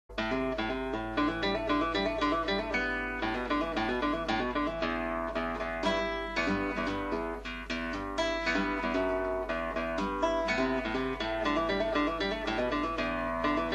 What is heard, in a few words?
Heavy metal guitar; Jolly Guitar